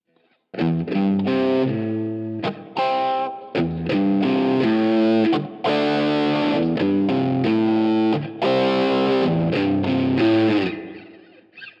Distorted Middle Bridge - Marshall Plexi And Tube Drive, pickups